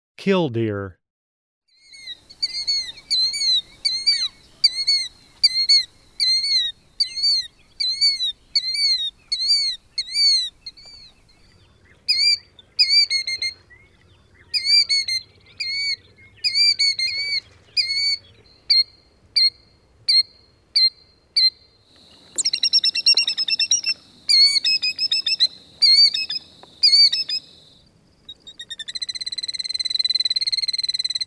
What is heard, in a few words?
killdeer